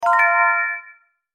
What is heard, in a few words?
bright harmony